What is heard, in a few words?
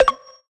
Retro FX; Cymatics; Video Game Sample Pack Vol 1